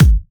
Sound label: Bassdrums